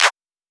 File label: Clap; Drum One Shots